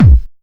good kick one shot b key, bass drum single shot tuned to the B note; kick drum samples